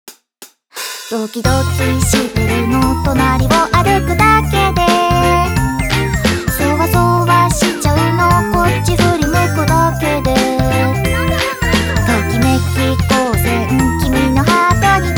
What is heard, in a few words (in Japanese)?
キャラクターソング; フ゜リシラ編